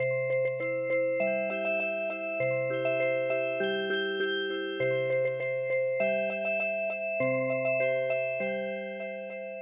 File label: seiro gan Music Box